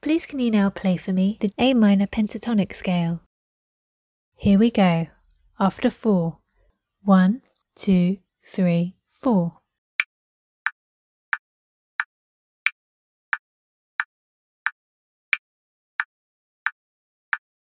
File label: a minor pent scale